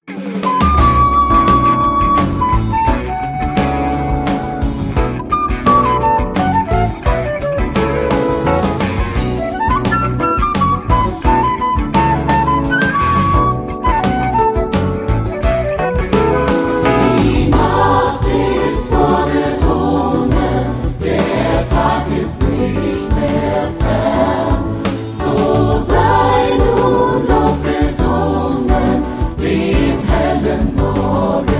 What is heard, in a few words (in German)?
Chor; Die Nacht ist vorgedrungen; Childrens Corner StudioChor